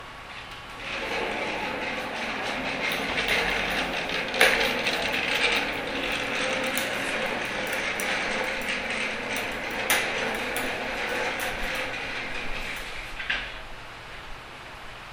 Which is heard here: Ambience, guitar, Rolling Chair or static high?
Rolling Chair